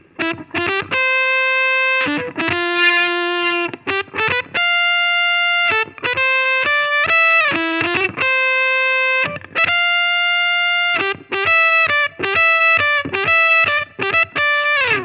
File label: trumpet section